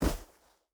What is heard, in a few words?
footstep burlap sand jump land; Rust